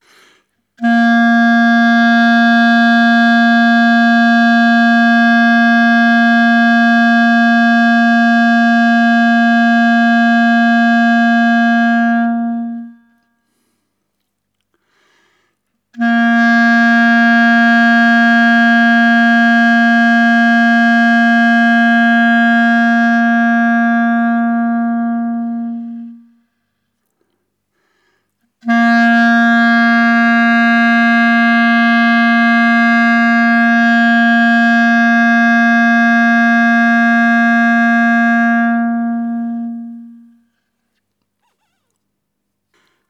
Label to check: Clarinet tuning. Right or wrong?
Right